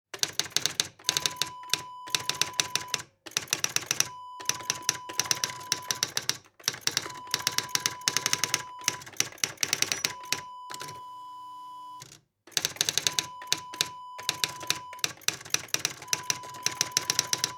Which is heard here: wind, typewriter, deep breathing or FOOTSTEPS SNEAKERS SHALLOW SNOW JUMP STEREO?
typewriter